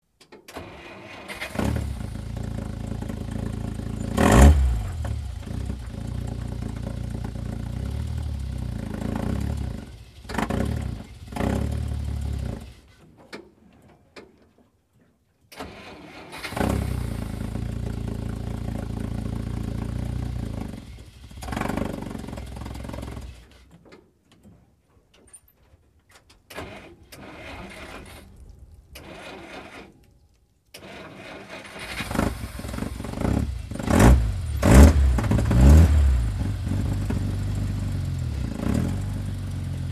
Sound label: Dodge Power Wagon Fire Truck, Interior Pov, Start, Various Rough Starts, Revs, False Starts, Stalls.